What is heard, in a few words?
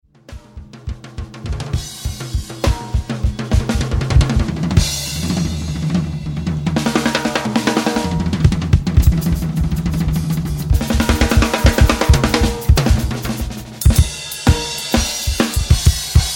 drum crazy